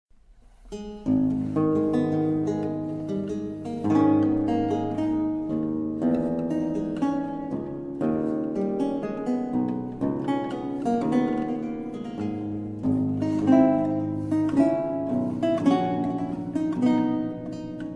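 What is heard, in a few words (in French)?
théorbe, cordes pincées; le théorbe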